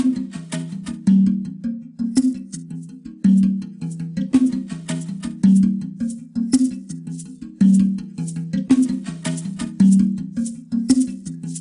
Jungle Level

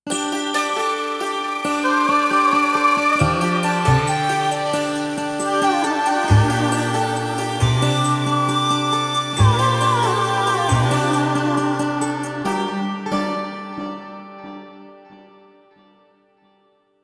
Approaching Dusk, Tide Island (Evening); monster hunter frontier sound box early music collection 2007-2014

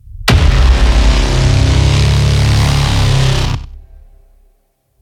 Hit; Monitor

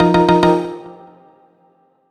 Jingle Pretend; Clubhouse Games: 51 Worldwide Classics

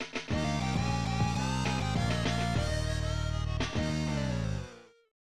Bonus Round Not Completed; Moto X; SNES

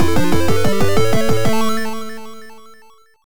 Level Complete; Turrican 2; Atari ST

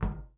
Footstep Metal; Among Us

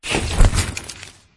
Click

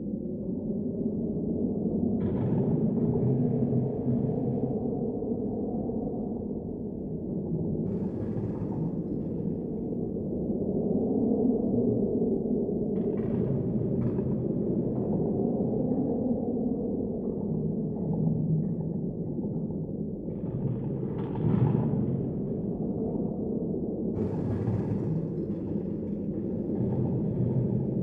Ambience; Freddy Frostbear; Five Nights at Freddys; FNAF Special Delivery; android ios

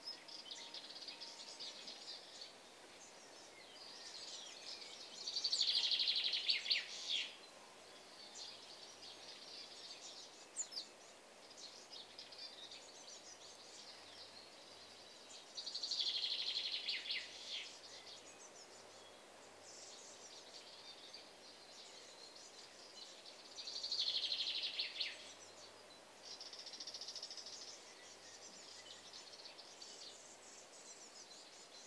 Green Fields; Munin; iOS macOS Windows gamerip 2014

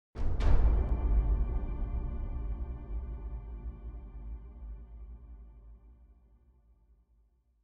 Citdel Big Drums; Frozen Flame; windows

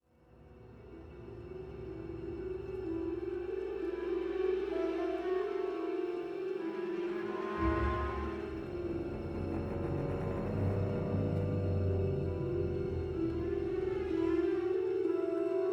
Loading Loop; Sullust

